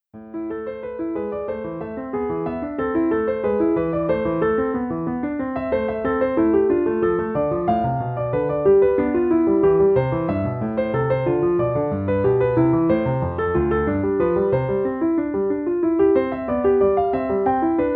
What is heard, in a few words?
Bach Invention no 13 87 BPM